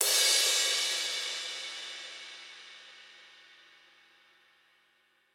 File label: drums crash